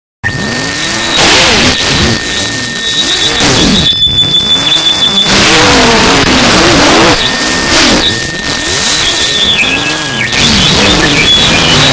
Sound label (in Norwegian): gitar